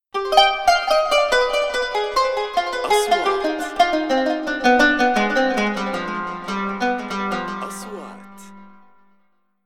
intro qanon

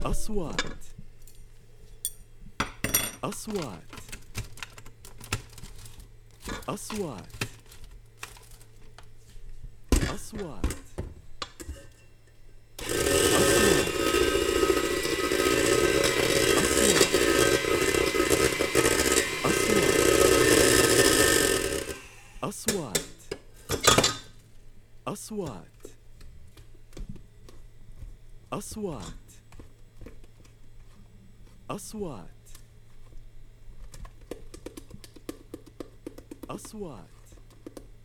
Mixer Kitchen

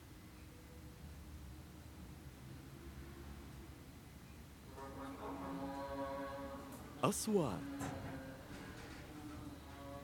Rooms Tune The voice Athan Salat the city

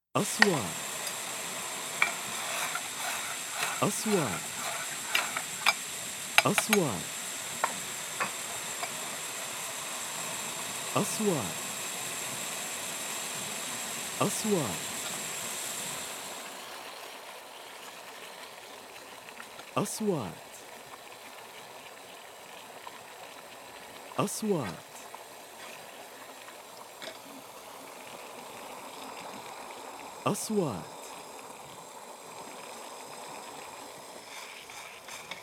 Opening the kitchen fire frying

